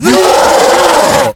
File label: Knockout; The Inn; Zara